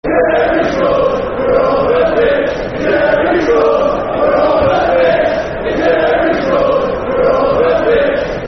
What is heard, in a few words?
Football Chant, Soccer Songs; Jerry Scores; Cheltenham Town Ctfc